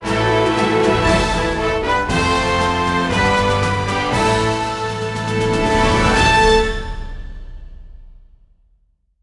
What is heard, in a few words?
Sting Mission Combat Complete; captain america super soldier; nds